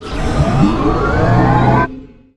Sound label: artillery charge; dota; crownfall